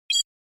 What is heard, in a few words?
Cyber